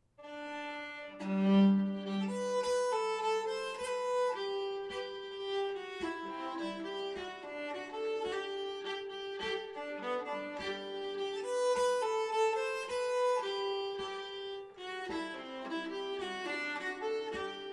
Schottis; efter Patrik Andersson

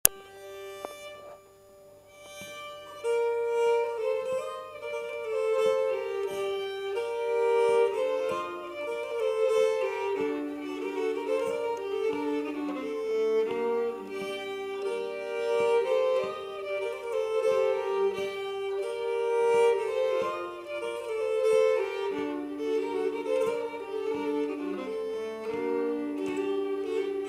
Slängpolska från Gotland

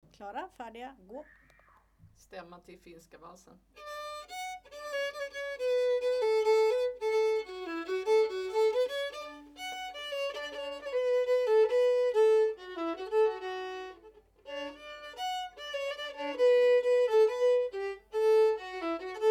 Stämma till Finska valsen

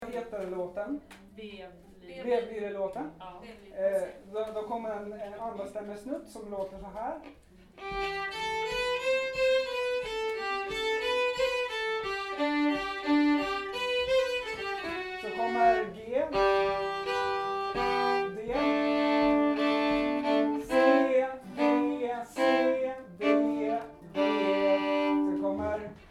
andra stämma; Vevlireposlka

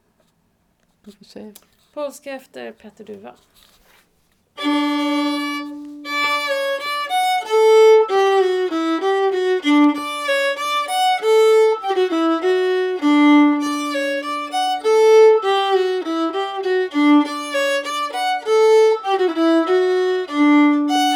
Polska; efter Sven Duva

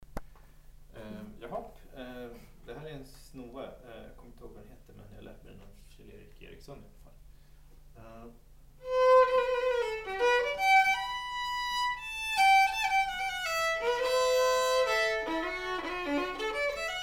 Pedagogisk Snoa

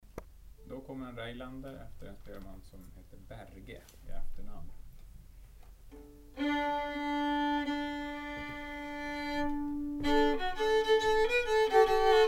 Reinlädner; efter Berge

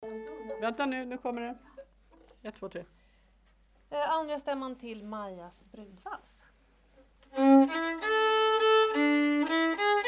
Stämma brudvals

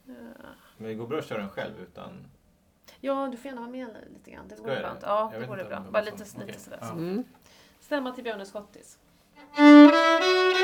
Stämma Björnes schottis

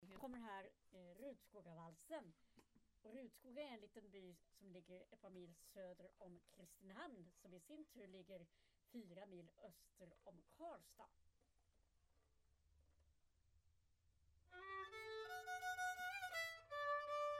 Rudskogavalsen

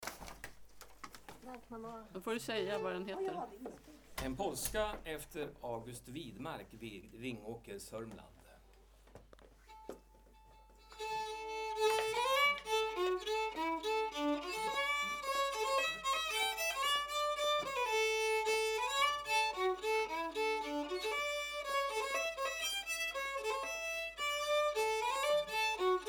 Polska

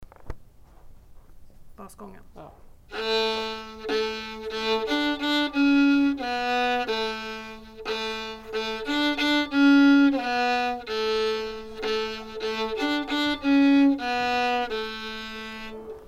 Basgång

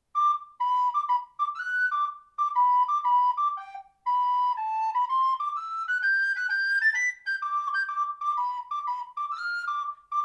Barnprogramschottis langsam

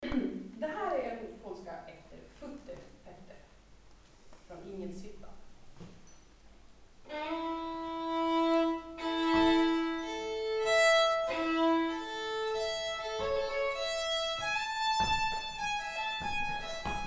Polska

